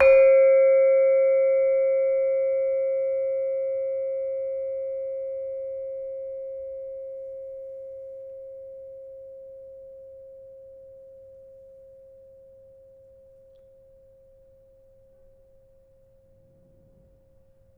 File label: Demung hard, gamelan